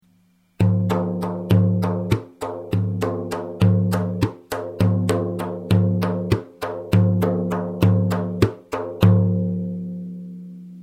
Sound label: tar, frame drum; notations